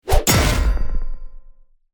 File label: weapon hit